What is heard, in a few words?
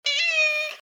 cat whining sound effect; digiliikunta